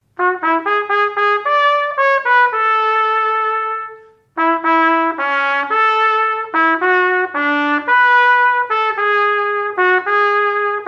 trompette

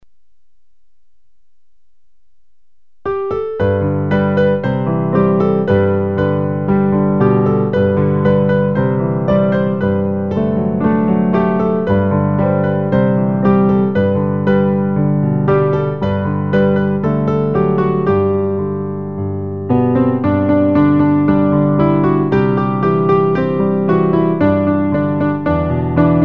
piano

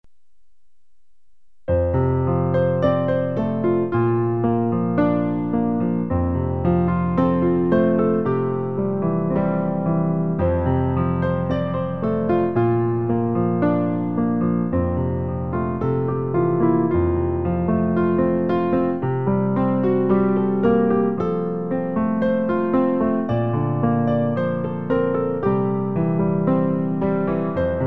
piano

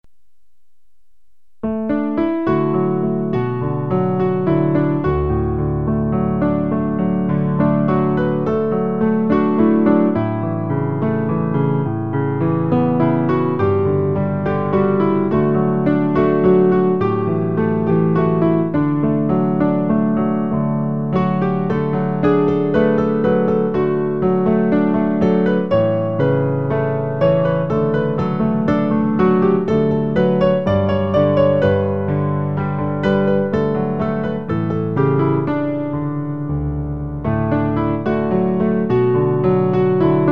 piano; La solitude; Pour accompagner le recueil Louez Dieu.

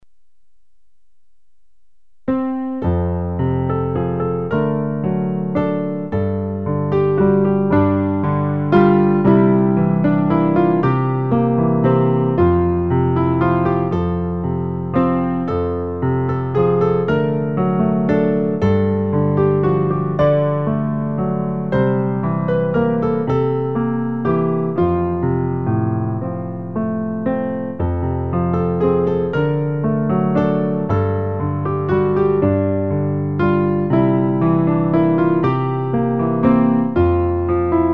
car dieu m a tant aime piano; recueil Louez Dieu

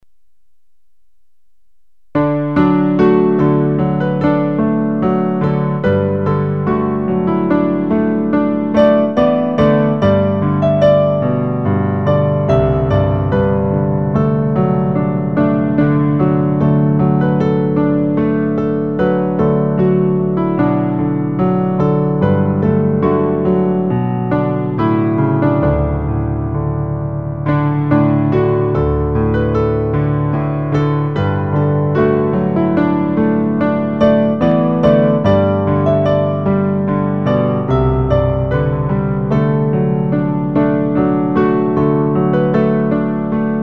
piano

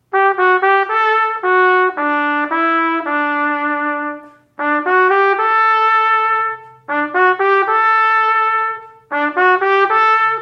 trompette

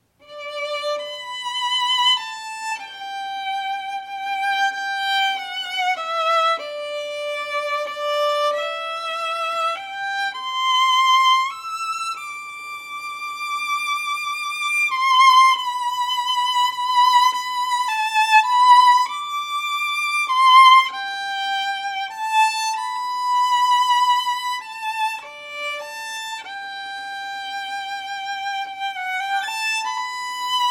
violon

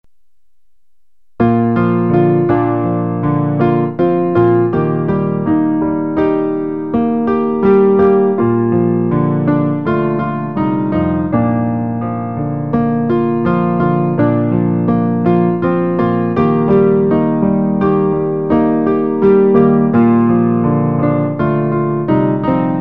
dieu tout puissant piano; recueil Louez Dieu

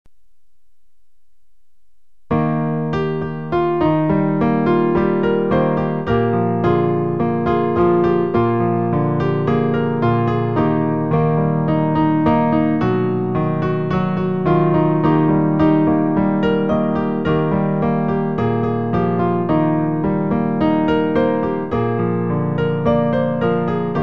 piano; Quand Jésus vient!; Pour accompagner le recueil Louez Dieu.

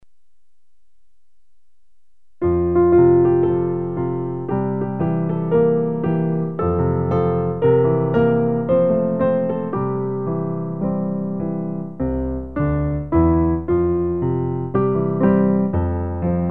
piano; recueil Louez Dieu

